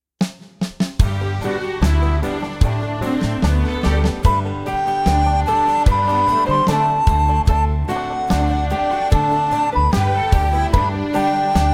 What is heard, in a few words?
Shoo Fly 148 BPM